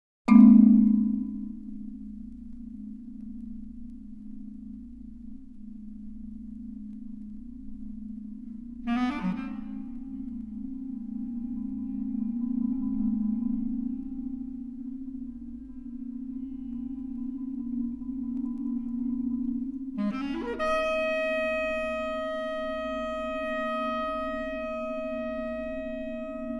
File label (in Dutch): mazimba en basklarinet